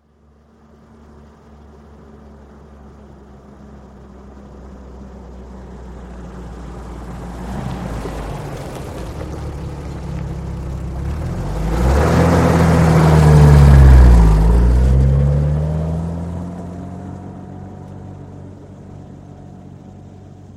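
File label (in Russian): УАЗ медленно подъезжает и срывается с места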